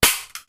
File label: Staple Gun